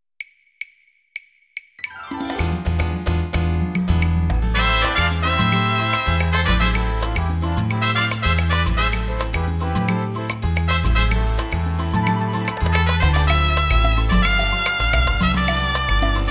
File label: Salsa